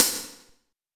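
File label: hi-hat, drums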